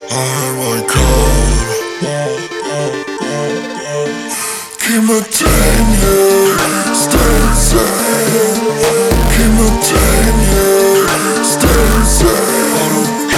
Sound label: pre drop pitched down transition